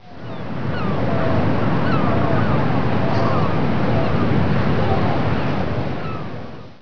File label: seagulls